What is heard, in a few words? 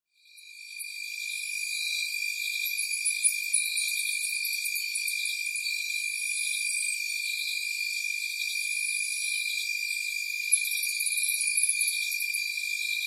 frogs